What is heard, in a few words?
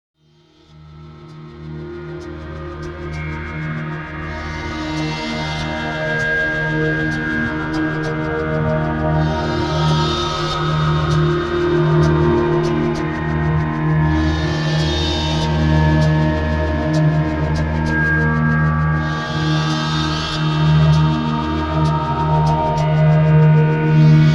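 Reverse Harmonic Overtone Sequence; Bizarre Planet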